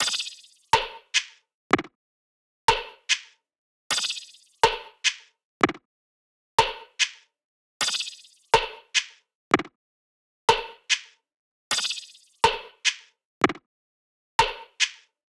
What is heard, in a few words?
loop perc 123; Seismic Loops